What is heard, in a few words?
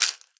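rattlebone, percussion; ILIO; Synclavier Percussion 2; World & Orchestral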